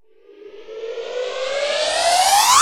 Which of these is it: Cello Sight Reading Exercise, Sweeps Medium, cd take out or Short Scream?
Sweeps Medium